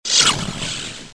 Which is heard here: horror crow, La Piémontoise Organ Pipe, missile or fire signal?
missile